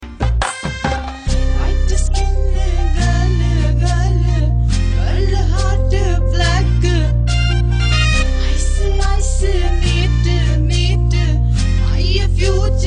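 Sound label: female version; ringtones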